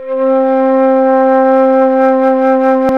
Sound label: Flute; CMI